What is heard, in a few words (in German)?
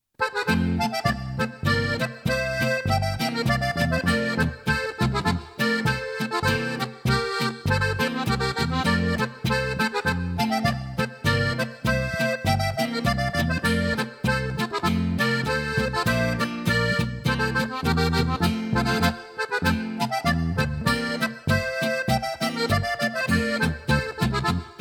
Akkordeonspielereien, Boarische